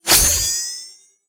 Fight Pierce; mighty party 2017 gamerip pc ios android

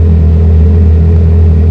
carrera idle

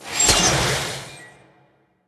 Teleport In; Level16 Veznan

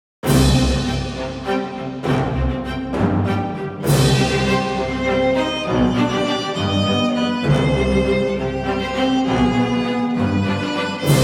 Violin; Konami Medal BGM Selection; エターナルナイツ4

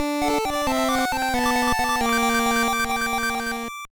Miss; Game & Watch Gallery 4; Game & Watch Gallery Advance; Mario's Cement Factory; Modern Mode

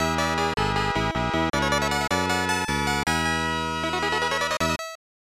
Promote; super widget; snes

